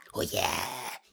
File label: monster taunt; spiritheroes